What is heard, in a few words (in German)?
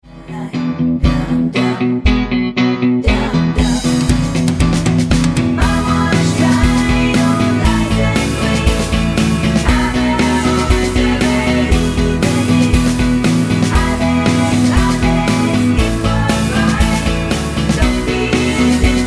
mit Chor